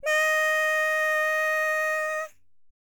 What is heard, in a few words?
HIMALAYAN, World Voices; ILIO; Vocal Planet VOL-5